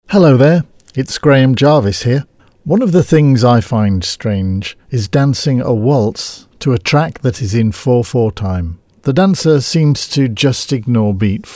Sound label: Waltz in 4 4 time